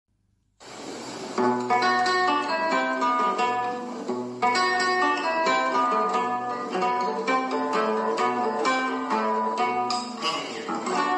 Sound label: Bikalam Guitar